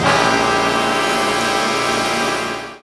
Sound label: HIT Band, Big Band Hits